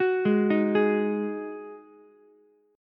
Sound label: D sharp Minor Double Flat 5th, Ukulele; arpeggiated; chord